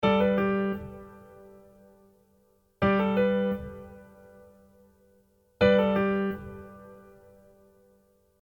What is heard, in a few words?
add9 from tips G1, accompaniment technic